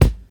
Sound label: high quality treble rich kick single shot G sharp key